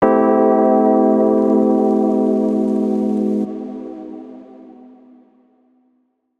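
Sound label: Lo-Tech one shot key A minor, Degraded Tape; Lo-Tech Premium Degraded Tape FX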